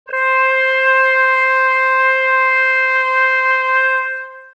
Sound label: flute c5 sines